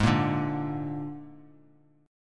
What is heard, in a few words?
G sharp Suspended 2nd Flat 5th, Bass Standard; G#sus2b5; strummed; JGuitar